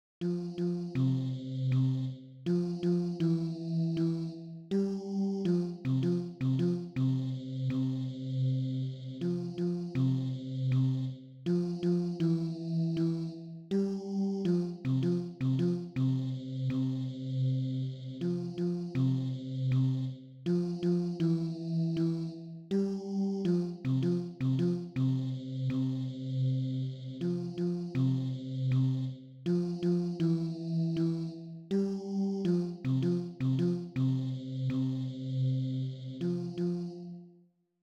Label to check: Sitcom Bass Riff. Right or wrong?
Wrong